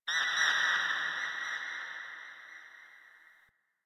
interior texture high pitch screech; Ambience; Misc Indoor; DE; Warframe; Infested Eris

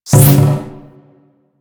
Dojo Build, UI; Warframe

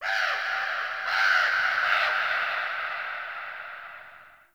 Day Bird Of Prey, Ambience; Glades Of Andurin

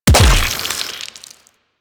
Golem Grenade Explosion; Warframe; Attacks

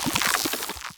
Sludge Damage Proc; Procs

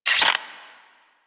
Generic Bow Load Arrow; Warframe; Foley

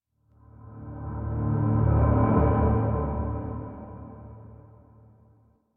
Transition Lead In Cue; Warframe; DE; Entrati; TennoCon2023; Quest; Titan

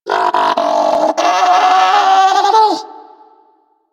Lure Call; Warframe; Hunting Critters; Orokin Kubrow

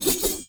Dagger Spin Whoosh; Sinecure Dagger

